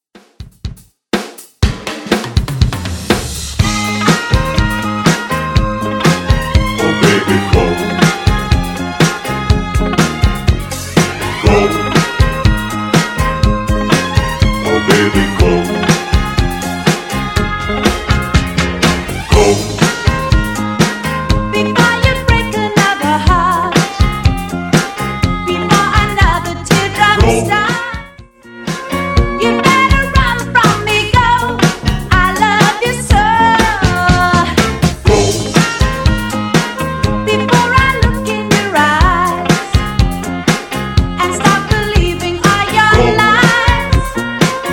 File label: 70s Redrum 122bpm